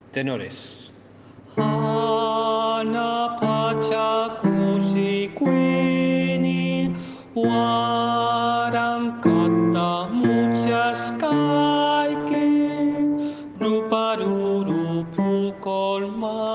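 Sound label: tenor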